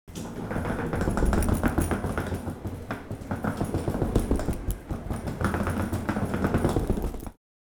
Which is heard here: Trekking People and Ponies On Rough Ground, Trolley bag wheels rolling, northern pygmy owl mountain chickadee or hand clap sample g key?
Trolley bag wheels rolling